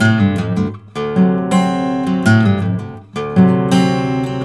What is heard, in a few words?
BARI RIFF, Blues Guitar; ILIO; Fretworks; 108 BARI RIFF